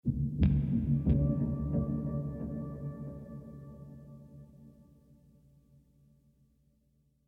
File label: Lap Steel Rhythmic; ML Lap Steel Rhythmic Mood 6